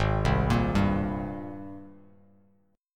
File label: Ab Major 9th Ab down Bass Standard; AbM9; arpeggiated